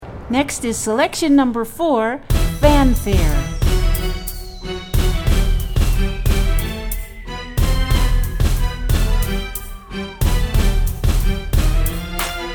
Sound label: Fanfare